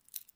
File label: Edit Attachment Sound, Weapons; Game Kit Controller